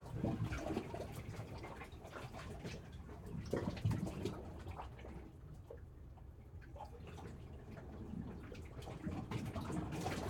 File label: Small cave waves, Calm sea; Ivo Vicic